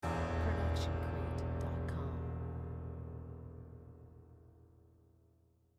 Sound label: menacing low piano hit